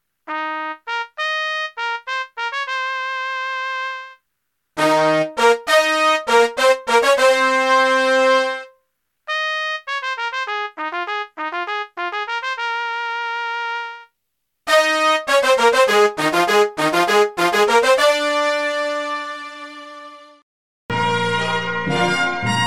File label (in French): indicatif 1ere partie